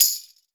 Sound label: FREE TAMB, TAMB; Univers Sons; Basicussions